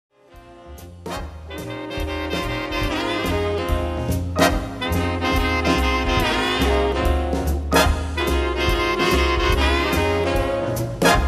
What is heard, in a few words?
big band